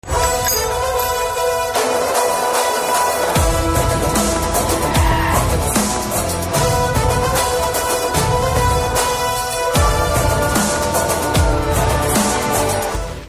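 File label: Trailer background music Ringtone; Simmba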